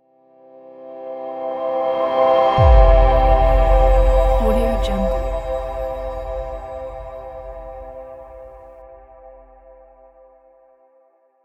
clean ambient logo

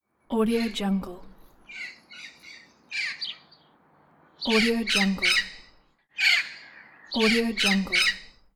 parrots

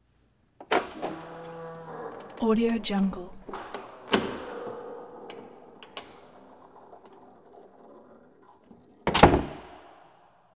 fire door open lever clack; prosoundfx

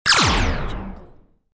space rifle

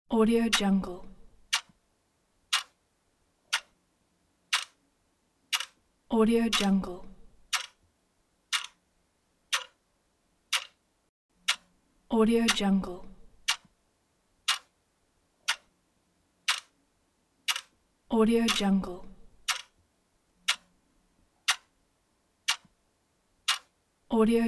clock; teldrassil

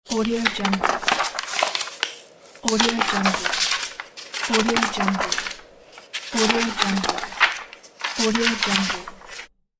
paper

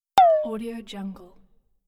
cute game bouncing movement; pluggedinrecords

